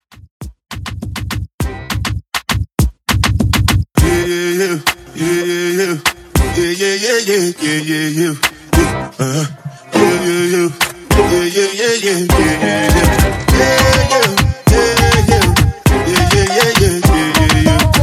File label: 101 BPM